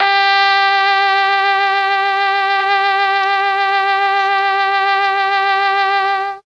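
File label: G3 trombone trumpet, mellotron; TROMB+TRMPT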